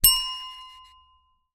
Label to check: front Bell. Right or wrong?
Right